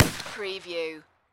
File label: Pistol Rhuger 44 Magnum Single Shot Exterior At 45ft